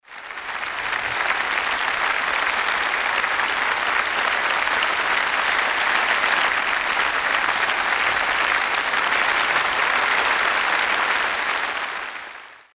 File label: Theatre applause; Rosegarden